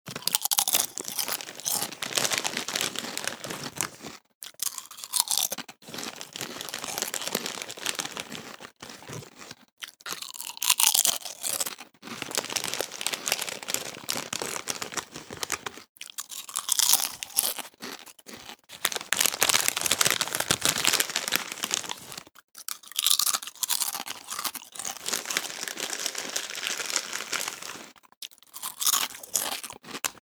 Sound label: Munching sound of eating potato chips; Eating Crisps; Human